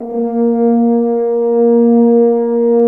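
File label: french horn, brass; Roland - LA Composer vol. 1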